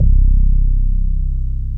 BASS; ZERO-G; Datafile

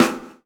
SD ROOM, snares; E-Lab; X Static Goldmine 2

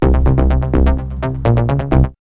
B-LOOP

